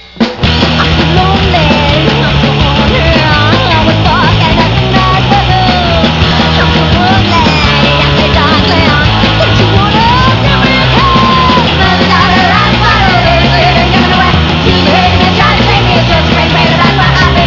chipmunk

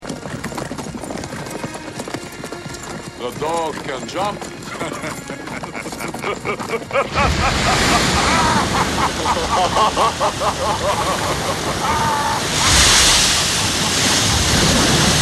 jump

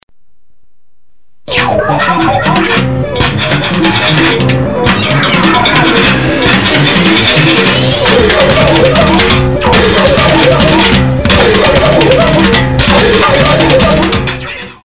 rebellion chant

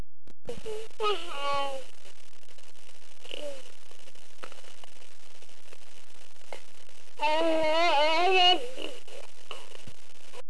babble; Babbling 5 mos.

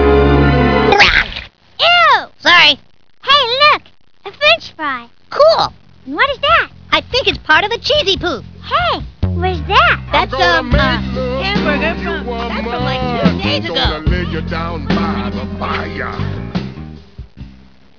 puke

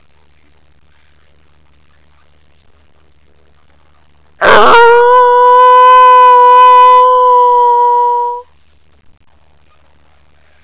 wolf howl; the Master's howl

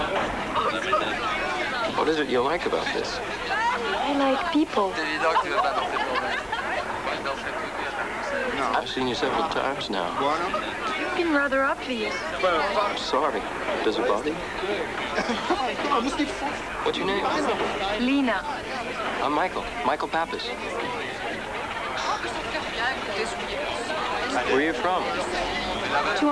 bus

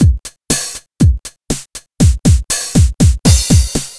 hh 909 fill, 60

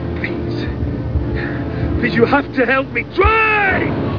drive

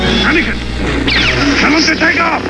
takeoff

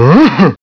earth grunt; Earthquake Grunting; Temple of Samurai Shodown

